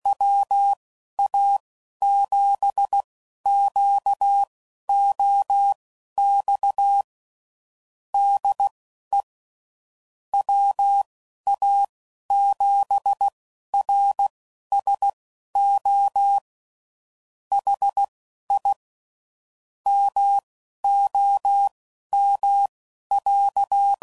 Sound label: CW Code Groups 10WPM, QSO; HamRadio; Morse Code; WA7QOX